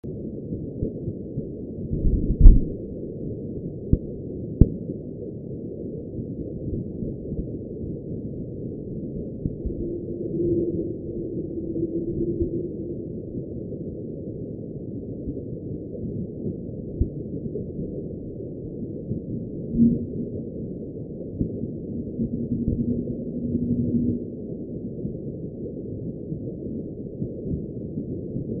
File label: low pass